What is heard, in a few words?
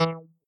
fire